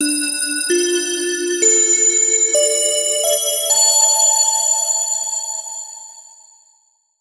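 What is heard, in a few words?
D Bells, 130 bpm; Zero-G; Future Wave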